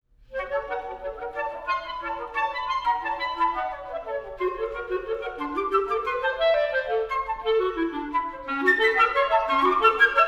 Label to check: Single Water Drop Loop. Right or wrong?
Wrong